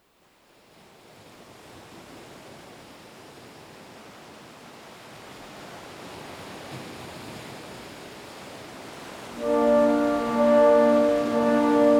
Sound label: orchestral; keencity